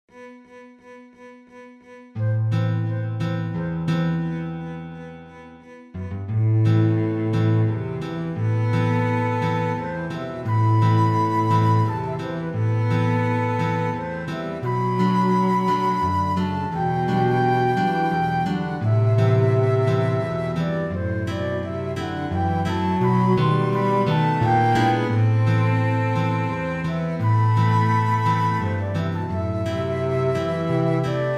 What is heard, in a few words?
Trio